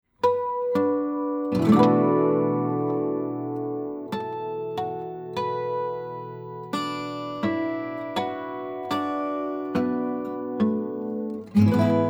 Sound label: 12 str, guitars